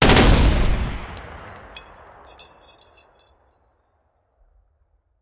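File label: Artillery Cannon Anti Aircraft; Sound Ideas; Guns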